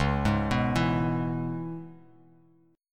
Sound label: C sharp Minor 7th, Bass Standard; arpeggiated; chord; 9,11,9,9; JGuitar